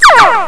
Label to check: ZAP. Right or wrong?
Right